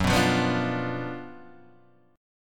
F sharp Major 7th Suspended 4th Sharp 5th, F sharp, chord; F#M7sus4#5 Chord {2 2 3 4 3 1} strummed